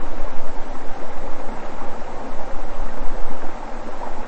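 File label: water single long gentle soft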